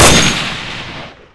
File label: aug, weapons; Counter-Strike Pack